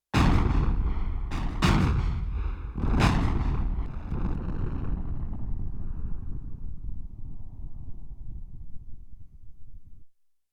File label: explosion II, Yamaha TX16W; Sound FX